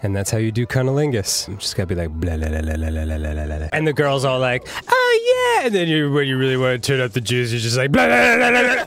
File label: blelelele